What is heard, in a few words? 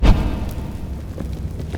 torch light up; We Were Here Forever